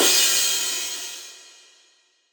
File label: 909 CYM, Tape 909; Goldbaby Tape808 & Tape909; The Tape 909